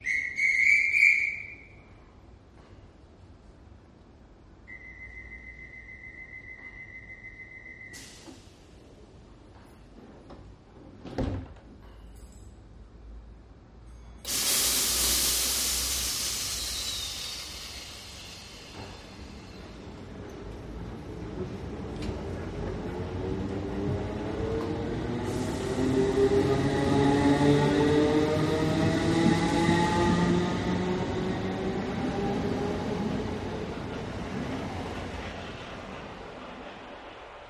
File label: Train Leaving Platform, subway